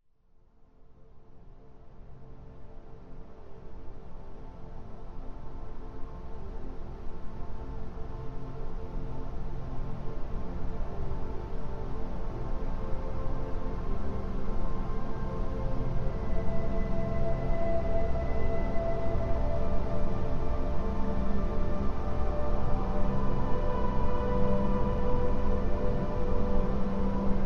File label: meditation binaural